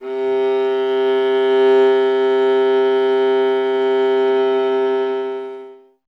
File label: viola C0, Solo Instruments; Miroslav Vitous; Solo Instruments VOL-1